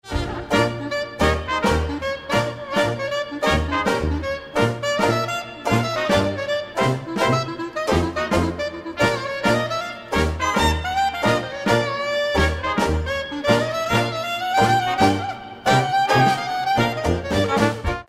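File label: clarinet, jazz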